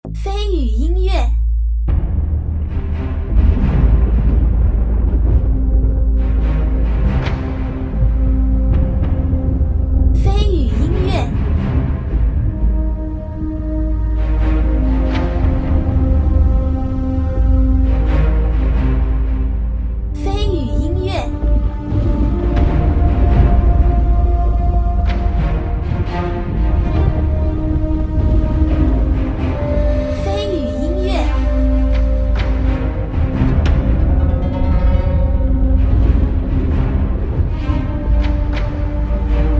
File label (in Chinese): 战斗 紧张 危险